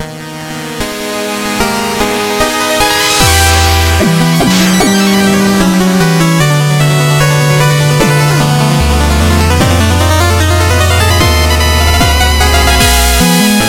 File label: Music Transform; Shovel Knight King of Cards